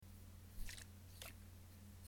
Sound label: snow globe turned around